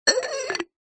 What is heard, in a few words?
household glasses drinking x2 stack inside each other; zapsplat